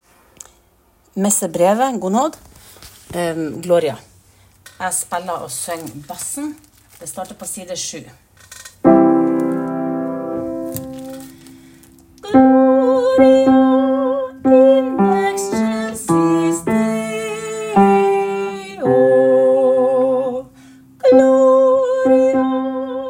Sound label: BASS Gloria; Gounod